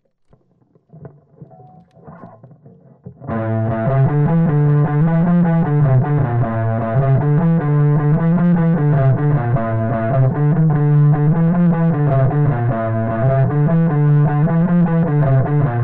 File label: arab